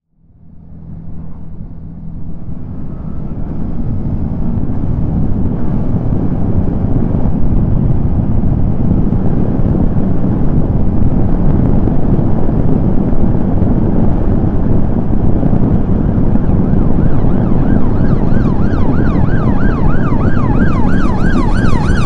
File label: Motorcycle Ambulance